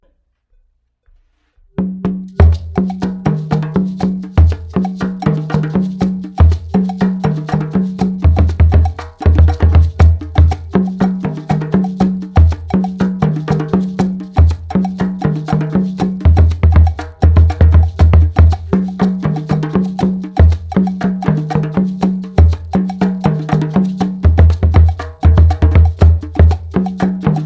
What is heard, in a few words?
120 BPM